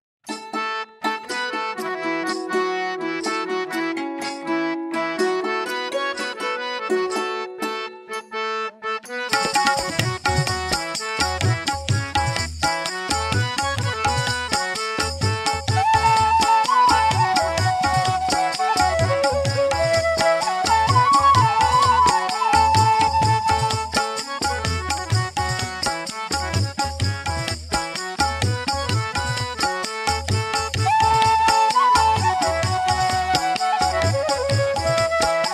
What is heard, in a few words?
Gents Karaoke A Pancham; Shivaya Namah Shiva